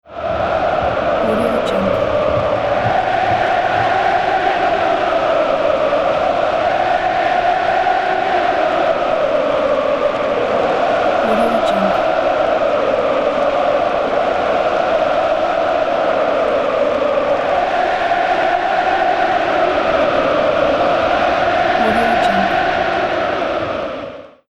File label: football stadium glee and singing fans